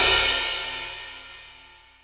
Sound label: Ride Cymbal